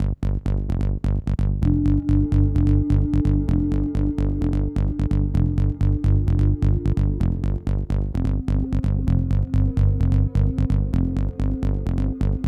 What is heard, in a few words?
Hardcore Breakbeat, 129 Cmin; Big Fish Audio; Hardcore Breakbeat Action